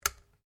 RIFLE ANSCHUTZ 67MSR 22 CALIBRE SAFETY OFF; A selection of domesttic firearms; Rifles; Guns

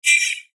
Beep Tone Signal Multimedia; Web Interactive

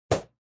Hit Snare; Rollover or button sound perfect for web pages, flash animation, and other web applications.; Musical

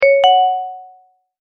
multimedia tone verified

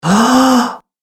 Gasp Male Long; Gasping Sound Effects

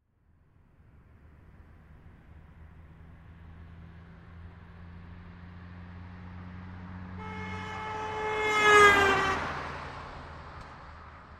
Ford Escort 1986 Drive By Right To Left 55 Mph

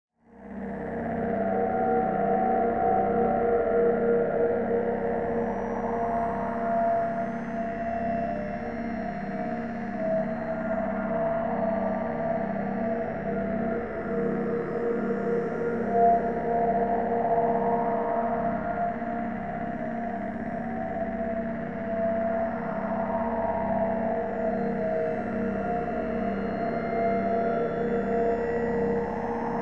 Drone Distortion Phaser Metallic Flange; 5.1 STEREO MIX; Blastwave

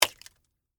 Impact Egg Drop; Texture, Distant, Vegetable; Drops

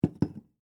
Glass Set Down, Glass, Set, Down, On, Wood, Table; Foley; Set Down Sound Effects; BlastWave

